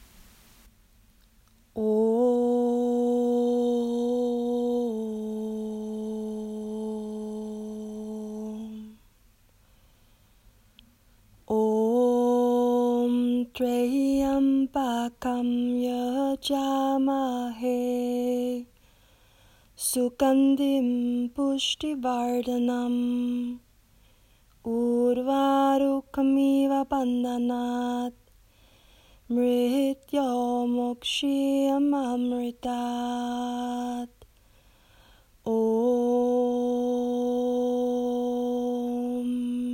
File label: Mahamrityunjaya Mantra